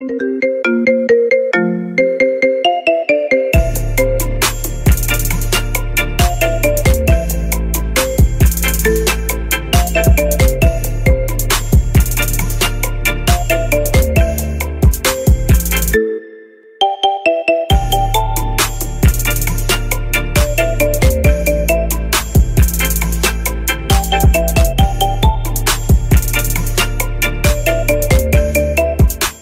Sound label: Marimba Remix